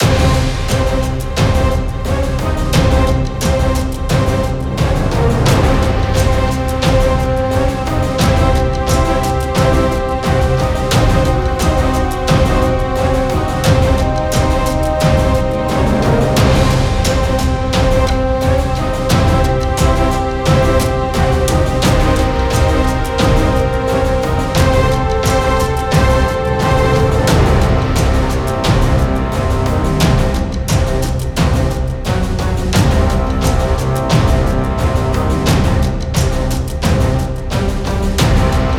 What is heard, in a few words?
Boss Epic Dramatic Egyptian Loop; Stage-4-Boss